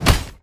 hammer

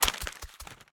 paper ball roll

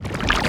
watergun cast

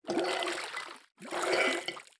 soup

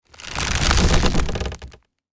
cloth strip fabric

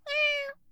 classic miaow

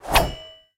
thin sword whoosh

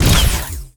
sea gun shoot

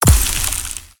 ice prick

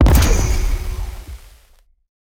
gold explo

